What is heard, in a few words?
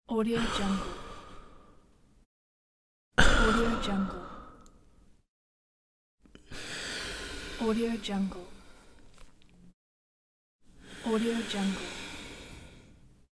male exhale